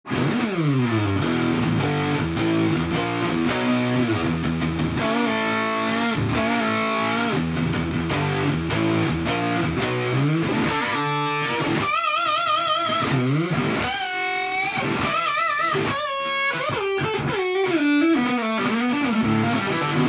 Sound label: rover fuzz, guitar; fuzzface ufo84 roverfuzz